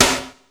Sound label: SN, Casio CTK-5000